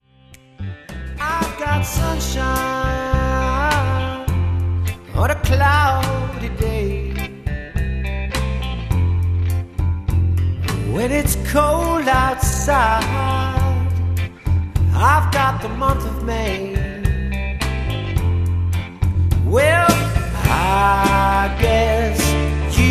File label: dance band